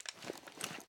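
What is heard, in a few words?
pistol 1911 fast reload empty end; gmod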